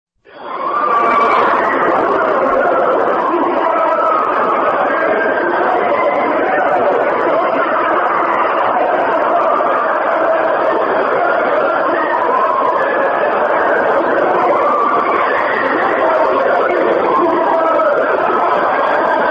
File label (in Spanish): Sonidos del infierno Pozo superprofundo de Kola